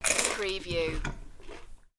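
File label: Bite English Muffin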